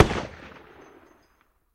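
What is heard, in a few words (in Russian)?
одиночный выстрел с эхом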